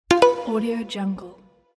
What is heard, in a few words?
mail